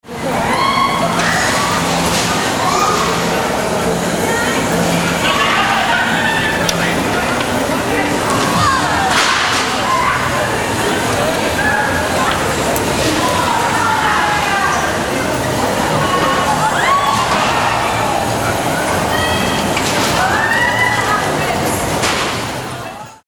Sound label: octoberfest car scooter